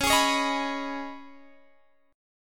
C sharp 7th Suspended 2nd, Guitar Drop D

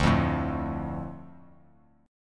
D Minor D, chord; Dm strummed; x,5,3,2; JGuitar

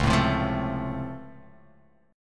C sharp Augmented Major 7th down, guitar chord

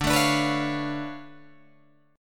D Minor Major 13th, down, Guitar, DADGAD

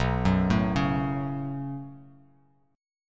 B 7th Suspended 2nd; B7sus2; arpeggiated; JGuitar

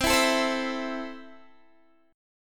C 6th, C, down, Guitar, Standard; C6 strummed

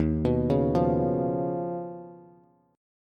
Eb 7th Suspended 2nd Sharp 5th Eb, Bass Standard; 11,14,11,10; Eb7sus2#5 arpeggiated; JGuitar

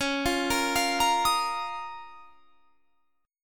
C sharp 6th Add 9th C sharp down; C#6add9; arpeggiated

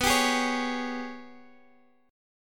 G sharp Minor Major 11th B

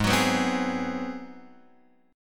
G sharp 7th Sharp 9th, Guitar, Open F; strummed; x,3,6,6,3,3; JGuitar

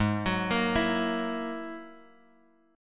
Ab 7th Suspended 2nd Sharp 5th, Guitar, Drop D

